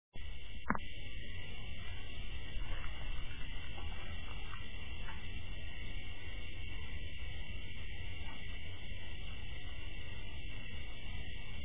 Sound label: Cricket Song